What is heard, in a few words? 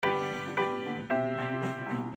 Piano